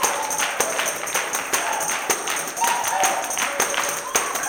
160 TAMB BRK; Blues & Soul

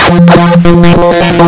lsdj waves; wavetables

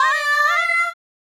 Fem Vocal 128bpm G FX, African Voices

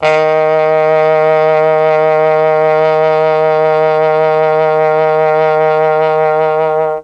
D#2 TROMBONE, Mellotron; CHMB TRMBONE; Mike Pinder - Mellotron Archive CD

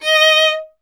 viola D; Miroslav Vitous; Solo Instruments VOL-1

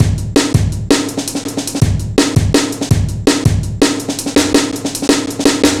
165, Drum Loops, Drum & Bass; Total Drum&Bass

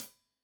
Hat Tight, Hi-Hat; Deeply Sampled Drums

